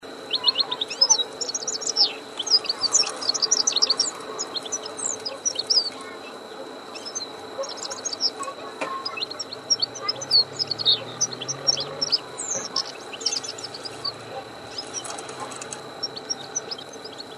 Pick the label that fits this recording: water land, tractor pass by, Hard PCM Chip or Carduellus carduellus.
Carduellus carduellus